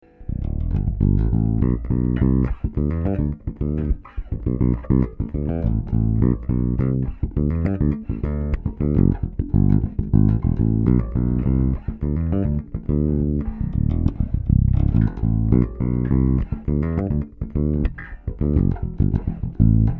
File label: Masterbuilt Woodblock Fingerstyle